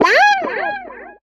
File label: Slide Up, Guitars; Zero-G Jungle Warfare 1, 2 & 3